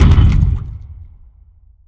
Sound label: DAMAGE alien Ruins Wall; Barotrauma; Room FX